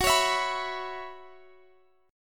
Gb 7th Suspended 4th Gb down Guitar Half Step Down